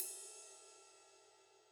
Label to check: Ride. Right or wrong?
Right